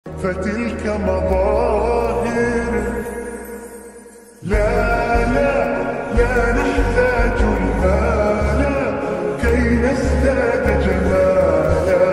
beautiful islamic ringtone, muslim, arabic ringtone